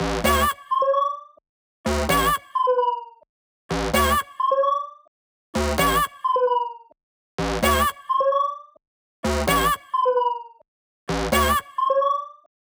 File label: Melody 1, 130BPM; Take Top Melody 1